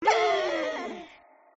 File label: virus destroy all; gardenbloom